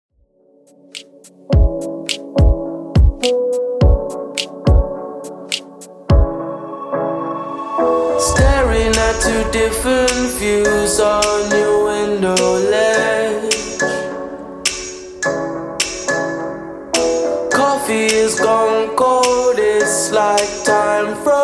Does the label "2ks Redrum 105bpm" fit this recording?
Yes